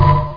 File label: Chime; Shadows